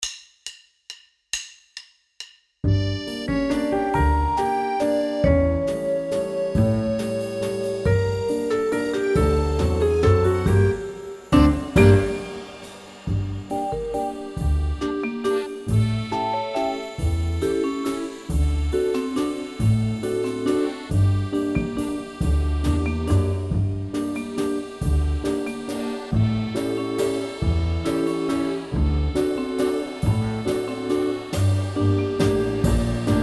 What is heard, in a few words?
Waltz